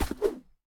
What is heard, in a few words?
Menu Open